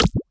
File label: Percussive